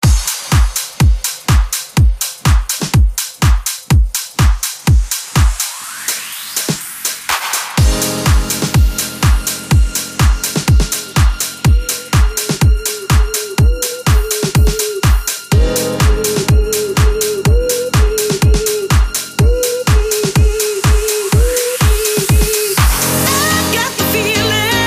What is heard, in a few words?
summer house redrum 124 bpm